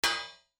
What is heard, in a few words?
UI Make